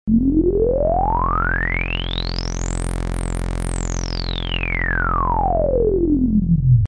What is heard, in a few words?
lp sweep